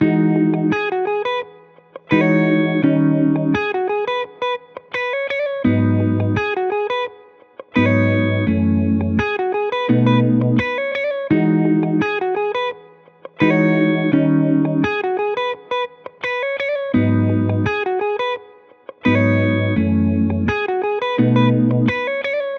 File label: 85BPM Key g m, real guitar loops; GoodVibes; vibe session